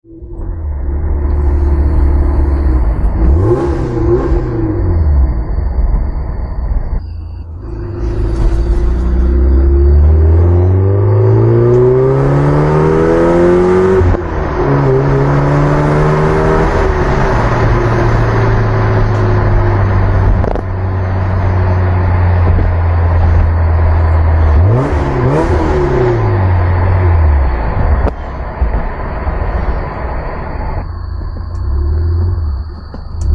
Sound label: s2 drive